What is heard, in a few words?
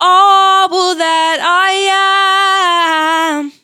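All that I am C major, Vocals Phrases; GhostHack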